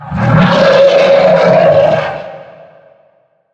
bloodsucker attack

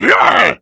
zombie pain

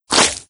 death splat; doom3

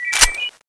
clip in, weapons; tfa cso

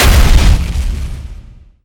pullout; gundyr

